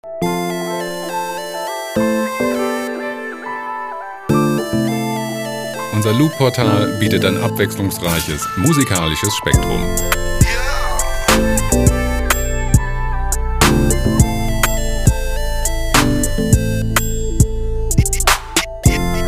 trap

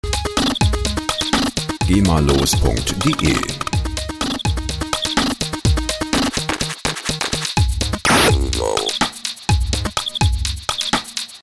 atari beat

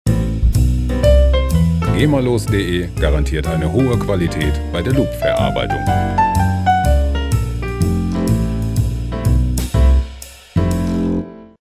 club jazz

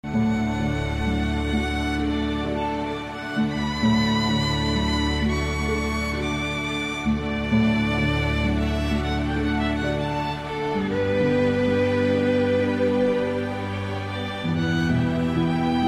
meditation

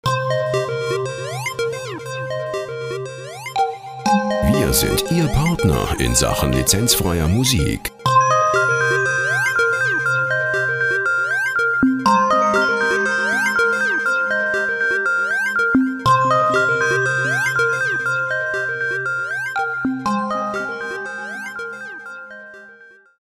synthesizer

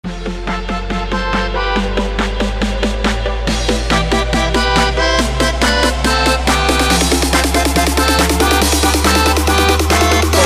polski dance

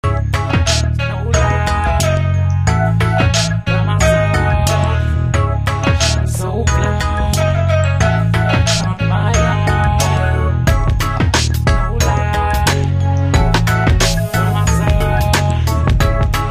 dirty south house

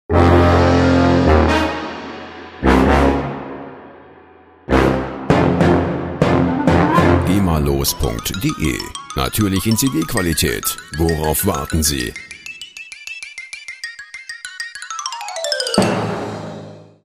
orchester tusch

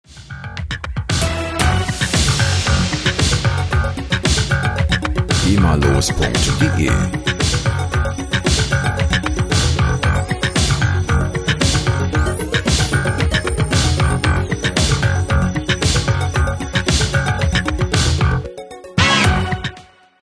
slap machine

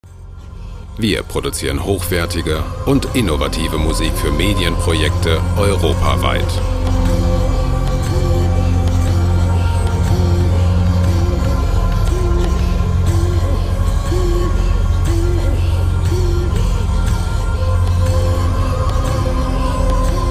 inuit dance

